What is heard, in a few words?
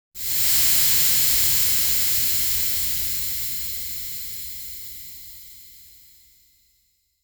steam gust sudden